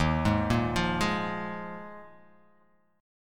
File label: D sharp Suspended 2nd Suspended 4th D sharp; x,6,6,3,5,3,x,x; chordshape